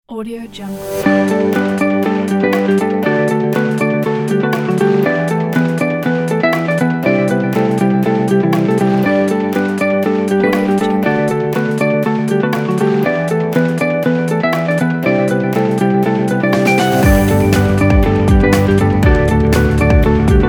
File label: motivational uplifting corporate; thesilentthunder